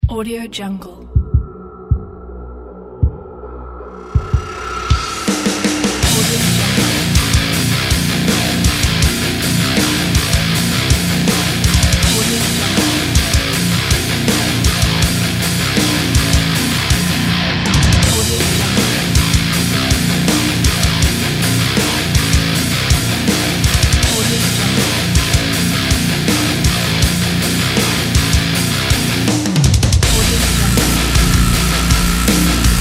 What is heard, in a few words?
action scene